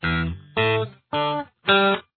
open string octaves, guitar

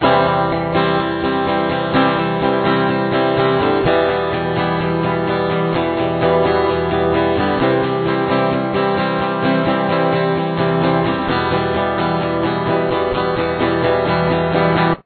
rhythm

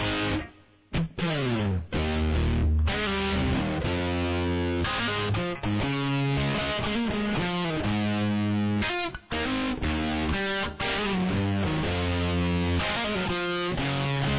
guitar verse

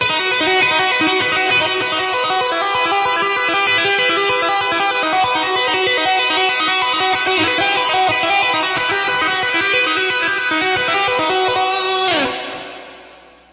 tapping, guitar

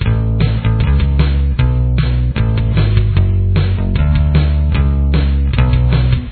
bass

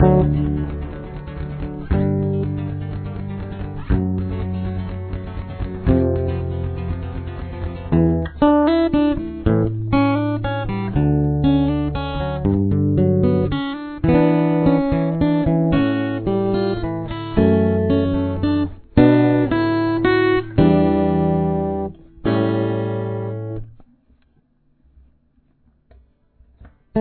outchorus

